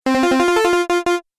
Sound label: Game Section Complete, video game